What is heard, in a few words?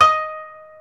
KOTO D#4, Earth Tones